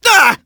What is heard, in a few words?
pain; sonic